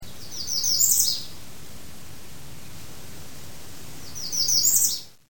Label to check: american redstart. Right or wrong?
Right